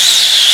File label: WIND, FAIRLIGHT CMI IIX